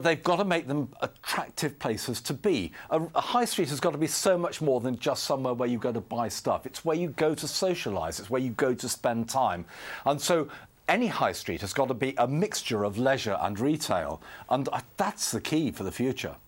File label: high street blues